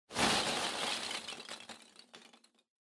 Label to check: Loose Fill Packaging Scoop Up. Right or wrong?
Right